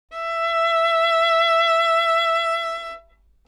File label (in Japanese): 押弦によるヴィブラート, 擦弦; E5; 弦鳴楽器